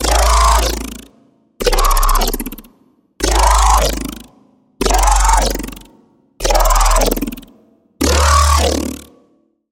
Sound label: Growl - Scream, Serum 2; Growl - Mire Scream; Routed Sound; Serum 2 Expansion - Chaos Bringer Vol.1